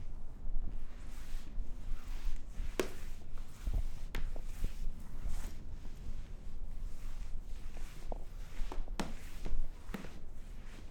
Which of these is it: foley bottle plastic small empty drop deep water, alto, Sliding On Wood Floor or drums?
Sliding On Wood Floor